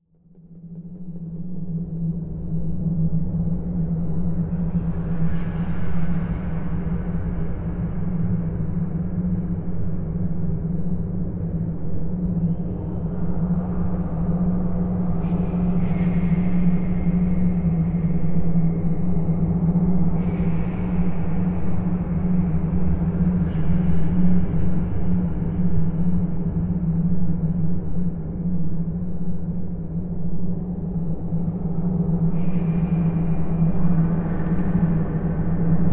Dark Cave Factory Atmo

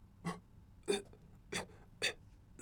Gagging

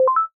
Sine Fifths Up Beep

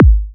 Kick

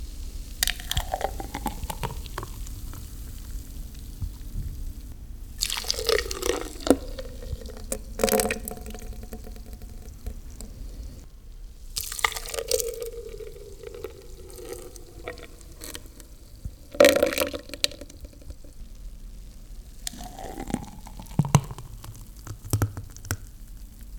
Smoothie Pouring In Glass

